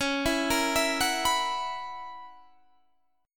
B Major 7th Suspended 2nd Suspended 4th Db, chord shape